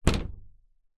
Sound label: household door open loft hatch jump